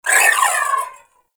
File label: Briefcase Akimbo Pistol Alt Proj Whizzby; Warframe; Corpus